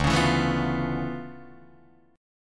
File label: D Minor Major 13th, down, Guitar, DADGAD